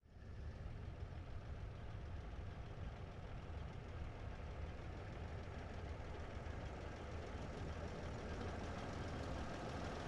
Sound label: 1927 Sedan In Idle Away; Period Vehicles